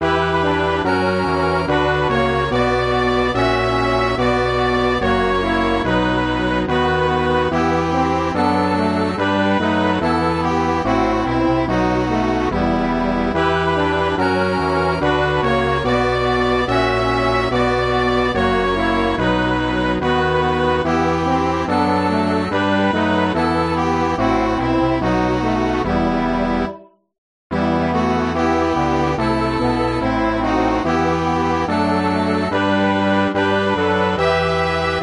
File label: MIDI